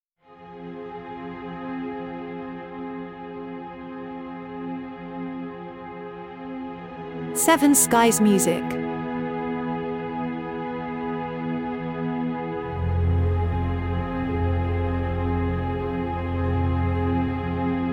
Ambient Reflextive

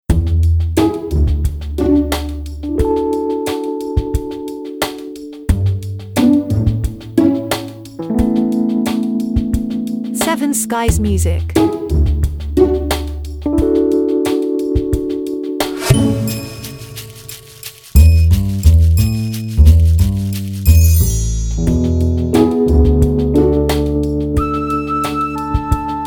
Light Comedy

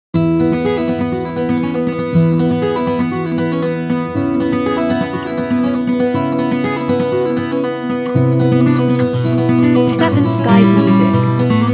Etude In E Major

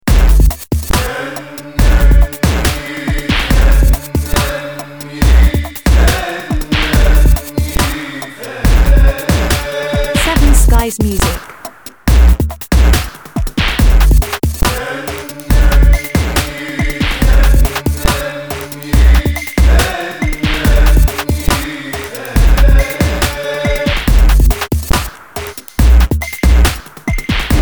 Dub Club

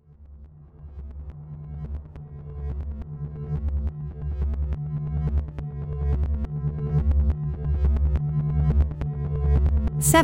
Techno Suspense tension

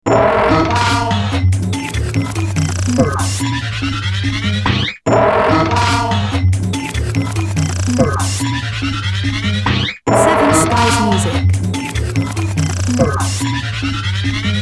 Fun time loop

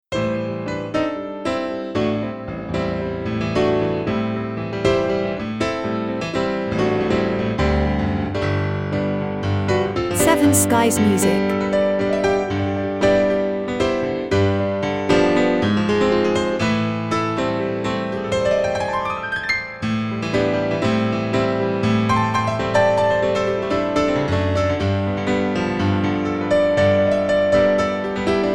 Jazz Piano improvisation

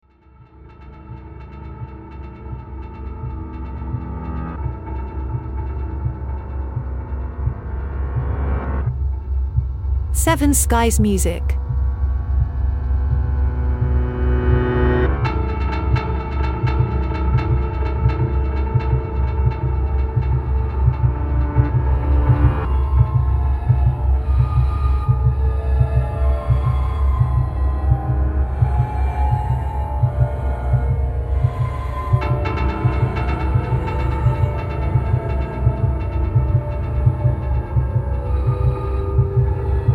Dark Ambience

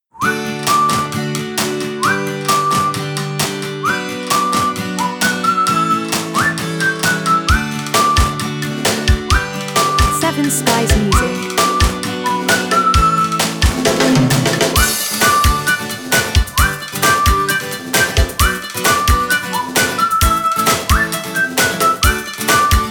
Carefree Whistling

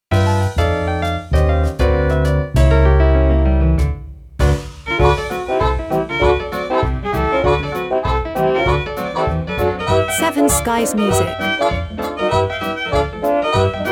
Dixieland

